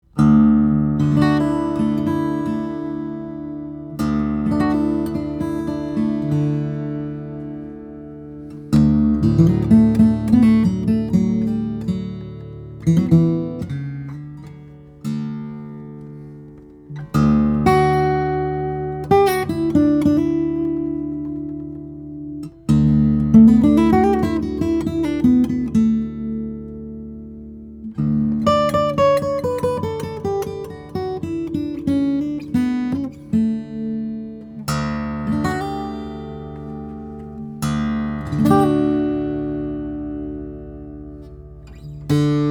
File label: OM, guitars, steel-string guitars; brazilian rosewood italian spruce; Jang